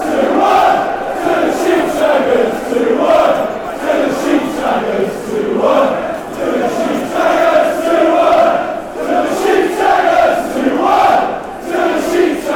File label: sheep, fanchants